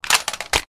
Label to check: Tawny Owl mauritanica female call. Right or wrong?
Wrong